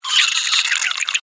Alien Insect Vocal, Scifi, Alien, Insect, Vocalization, Creature, Small, Science, Fiction; BlastWave